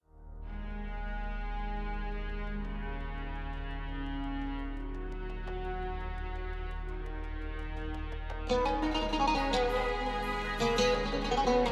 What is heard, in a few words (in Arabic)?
موسيقى تصويرية وطنية